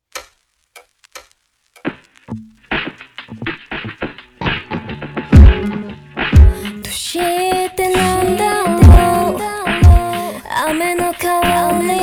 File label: reverb slow